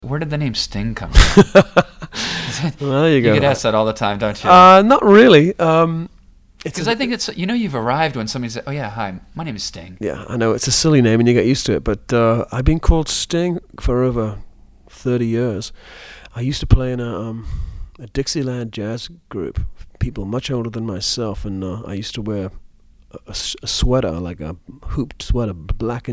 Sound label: Sting